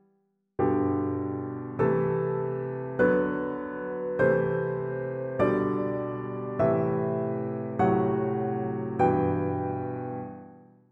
chords

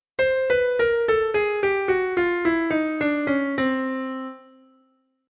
Chromatic Desc

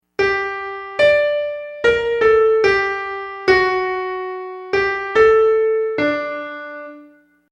Simple Quadruple

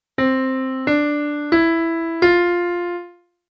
C D E F

